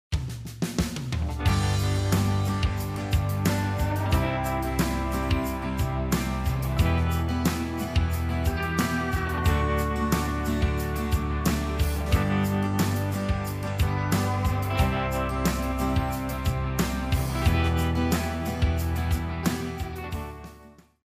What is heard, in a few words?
country